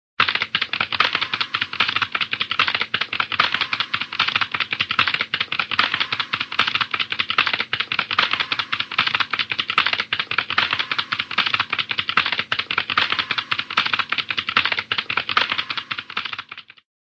machine, cartoon, funny little rhythmic machine clicks